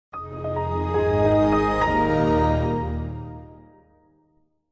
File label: microsoft xp startup sound